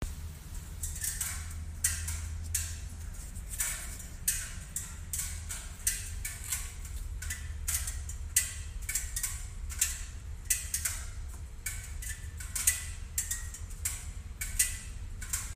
noise maxima noise, 7th generation maxima 2009-2015